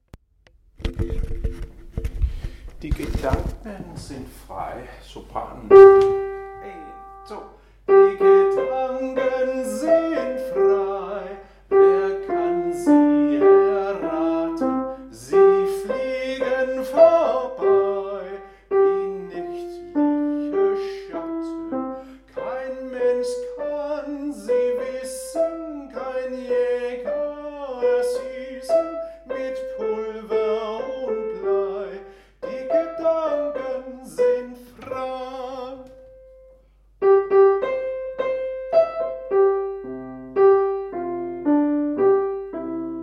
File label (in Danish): sopran; Die Gedanken